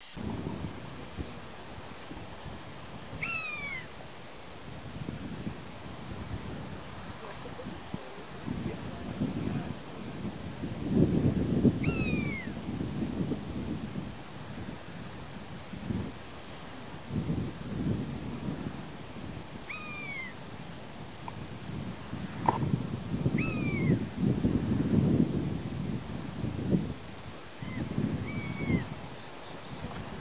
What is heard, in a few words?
common buzzard calls; Ullapool